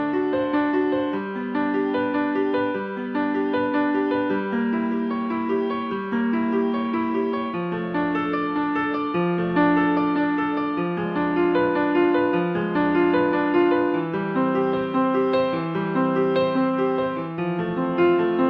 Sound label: Nokia N95 Bach